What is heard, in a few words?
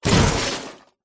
impacts junk hard crash metal plastic wood glass